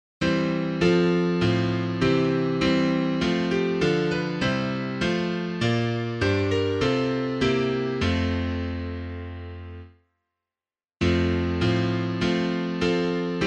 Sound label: piano